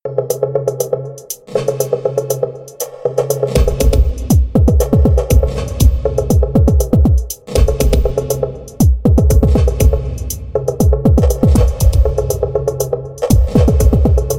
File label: Underwater Beat